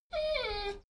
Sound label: dogo argentino whine short